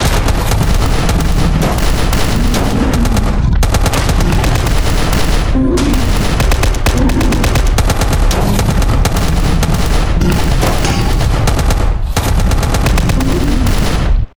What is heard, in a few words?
fightscene; STALKER